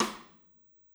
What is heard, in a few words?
DRUM; DRUM SUGAR